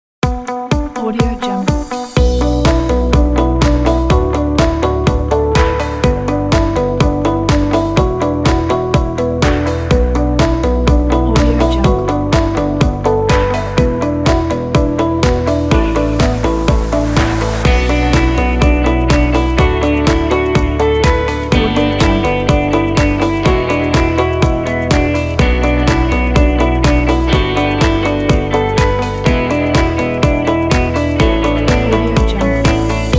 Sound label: Corporate Inspiring Pop